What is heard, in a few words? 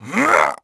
GRUNT; Pulse Racer